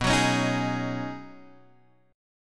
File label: B 6th Add 9th B down Guitar Open G